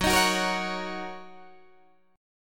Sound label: Fsharp 6th down Guitar Open D